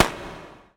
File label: firecrackers; ambient; newyear; dota